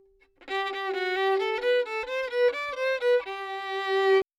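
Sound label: Dry, 3-4, G major, 128 bpm, melody, violin; Sample Banks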